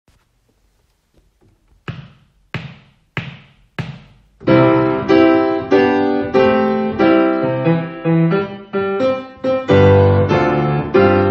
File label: Piano Duet Hunting Song practise speed; Grade 7; The Teacher part